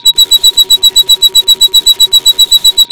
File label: ALARM FX; Big Beat Samples & More; AKAI; ALARM KIT